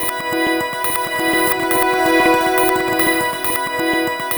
GLISTENING, STRATICUS; Spectrasonic Distorted Reality 1

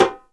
REPINIQUE; E-MU Producer Series Vol. 5 – 3-D Audio Collection; 3D Percussives; 3D Ethnics

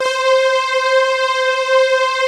ANA STRINGS, pads; Pro-Rec - Synthsonic

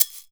ganza; E-MU Producer Series Vol. 7 – Old World Instruments

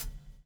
BUBL, BUBBLE; Spectrasonics - Backbeat

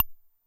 RIM SHOT, 909mod; classic machines

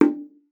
BONGOS, BONGOS-CONGA; Best Service ProSamples vol.42 - Session Instruments

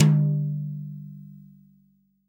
TOM, Real Drum Kit, SWING KIT

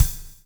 BLUES TK, Backbeat; Spectrasonics - Backbeat

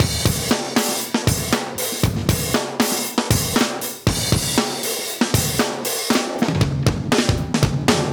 118 live breaks

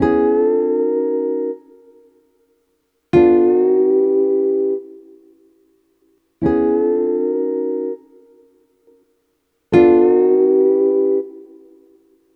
Electric Guitar

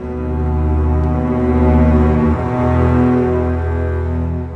Bass Cello, Cinematic

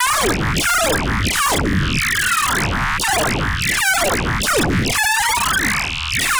Dubstep Atonal Pacer FX

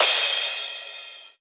Crash, Drums Cymbal

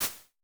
Shakers, Vermona Mono15 Drums

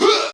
VOC MAD; AMG - Vince Clarke Lucky Bastard

